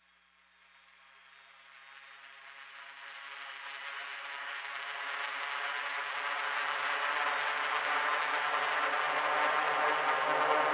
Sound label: Rise; Ninja Tracks